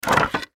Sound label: Button Lever Wood; Clicks; Foley